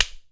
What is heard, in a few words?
PAN CLAPPE, KOREAN DRUMS; AKAI S6000 CD-ROM; WorldSounds